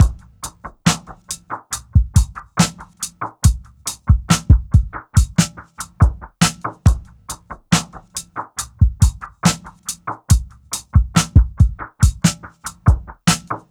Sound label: Underwater, Drums & Percussion, Electronica; Sonic Foundry (Sony Creative Software) - Electronic Point Blank